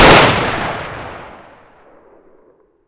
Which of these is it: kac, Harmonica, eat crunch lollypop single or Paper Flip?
kac